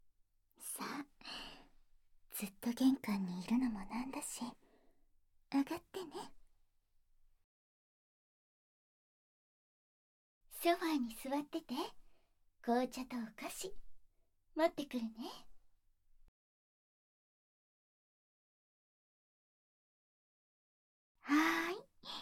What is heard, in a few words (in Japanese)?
膝枕で耳かき＆耳ふー, ASMR